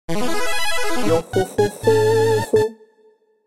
Jingle Normal Clear; Dandy Dungeon Legend of Brave Yamada

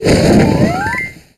fade in

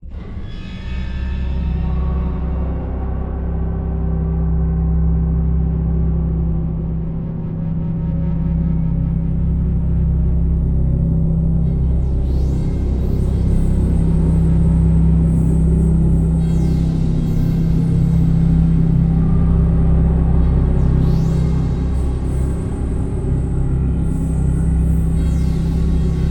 Death Drone Full

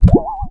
Skill Effect Poison; MilkChoco

